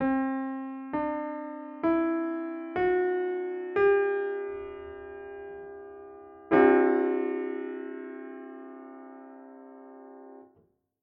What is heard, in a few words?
Otonal Pentad, 29edo